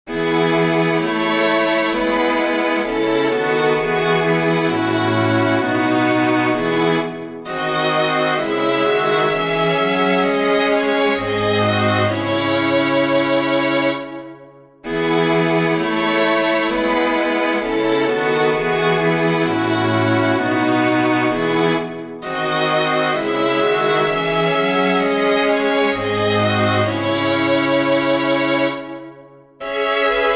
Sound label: chorale for string quartet; bach johann sebastian; erkenne mich mein ter